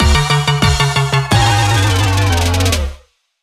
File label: Defeat